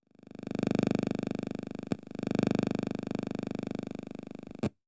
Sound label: Interference